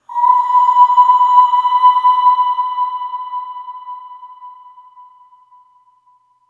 Ooh Short B4 Long Reverb; Harmonic Building Blocks

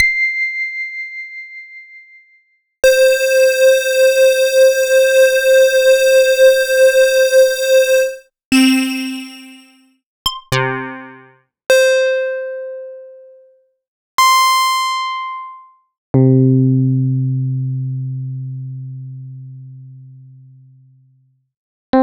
Roland MKS-80 Leads and Keys

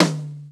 Percussion, House

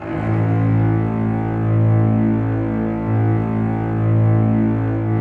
lush strings, Lush Octave; Optical Media International; Sonic Images Library

